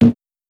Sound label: bonk; breakhack